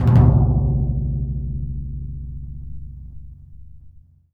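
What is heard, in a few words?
timpani C#, Synclavier Percussion; ILIO; Synclavier Percussion 2; World & Orchestral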